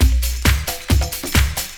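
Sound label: bucket beat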